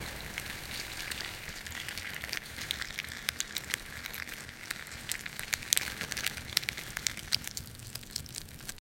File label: plants groth